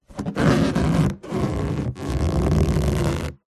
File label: balloon inflated handling squeak creak; zapsplat